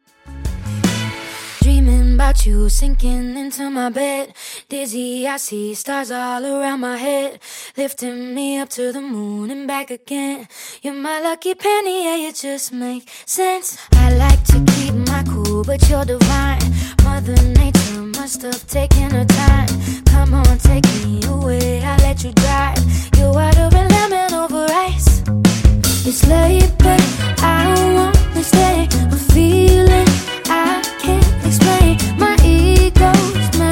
Slow Motion Intro Outro 73Bpm

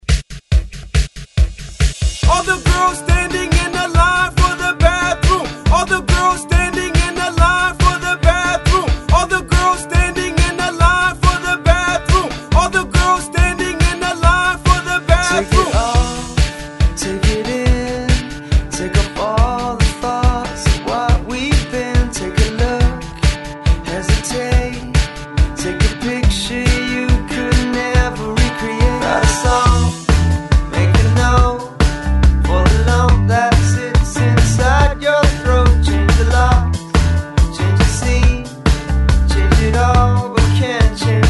Rock Hype ReDrum 140 Bpm

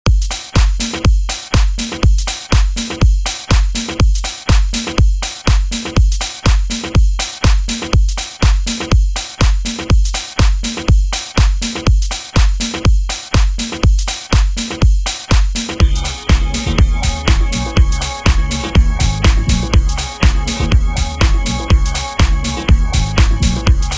80s Grid Redrum 122Bpm

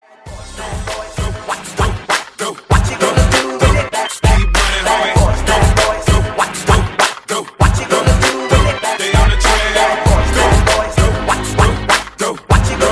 HipHop, Redrum, 95

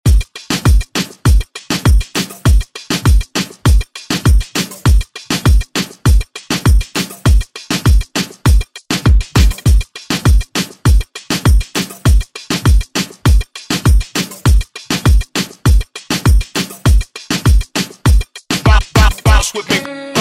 Reggaeton

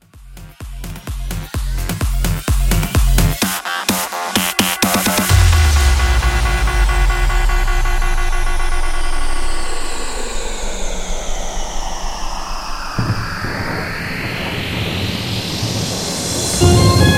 Big Room 128; Halloween Anthem 2021

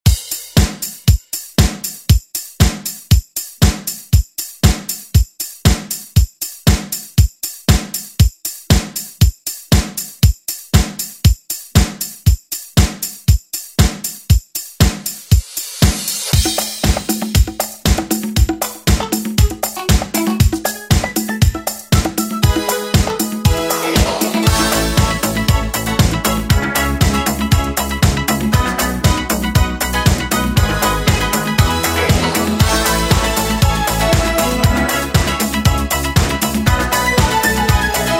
80s ReDrum; DJ Mhark

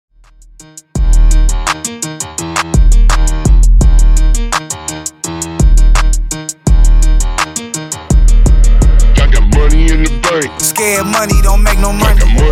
Hype

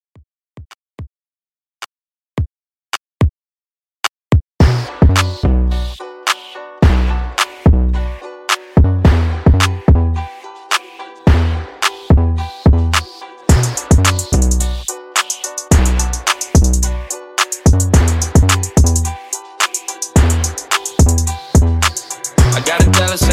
Redrum; Clean n Dirty

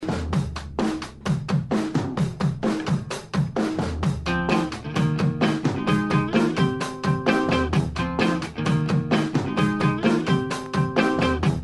Rock Extended 130 Bpm

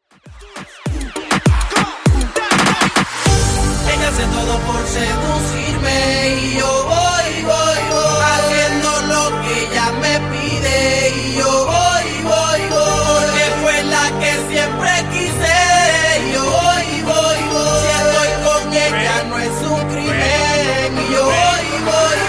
Reggaeton 100bpm CLEAN

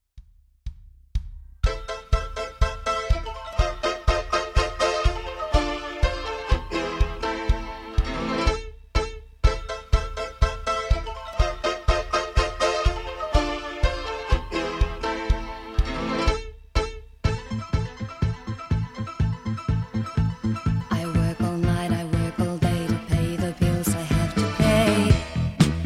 Dj Intro Outro 123 Bpm

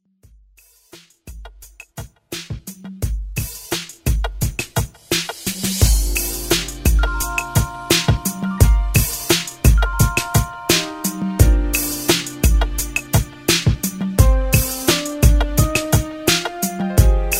Throwback Redrum

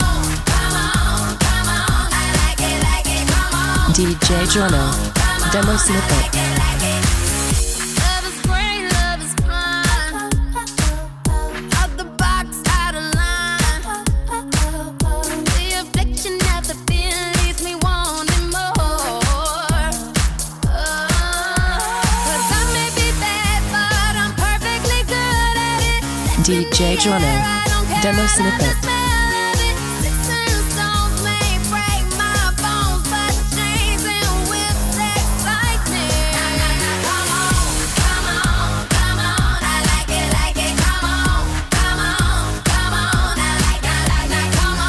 REDRUM 128 bpm Ebm

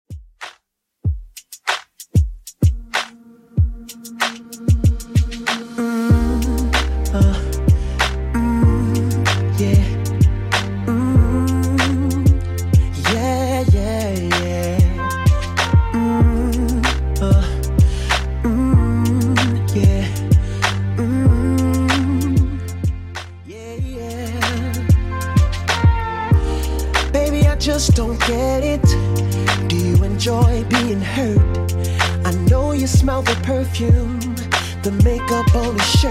RnB 95 bpm; Redrum

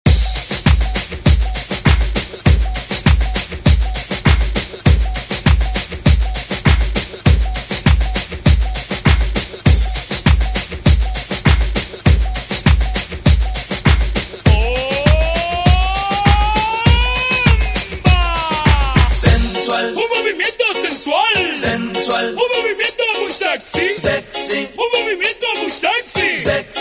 Latin Redrum; Deejay Hit

